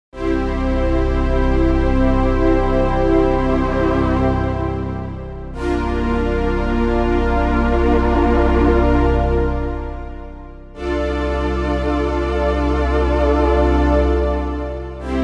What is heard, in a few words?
Mighty Pad, Roland JV-880; Factory Patches